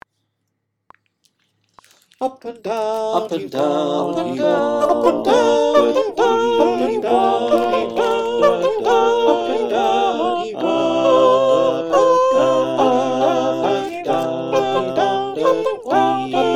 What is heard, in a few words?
SATB, choir